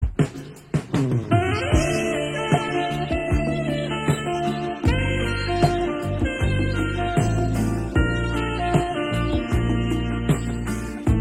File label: Romantic Saxophone Song; Meme